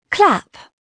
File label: CLAP; Dorling Kindersley My First Incredible Amazing Dictionary